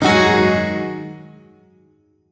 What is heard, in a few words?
Shawzin Yo Chord E; Warframe; Duviri